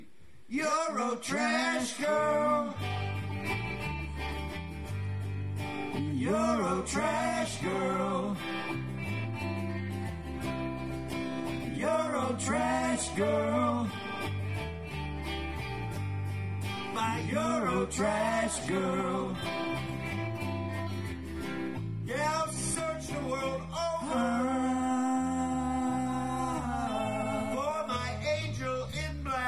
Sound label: Eurotrash Vocals and Harmonies; vocal practice